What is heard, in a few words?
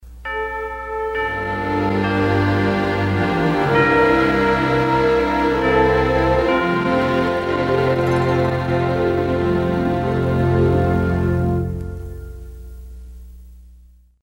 Instrumental Short; Oral Roberts; ORP Openers & Closers